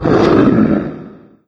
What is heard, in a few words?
mancubus chatter combat; doom3